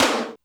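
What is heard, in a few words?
Tom Hi Thrash, Alesis D4 drum machine; 300 Drum Machines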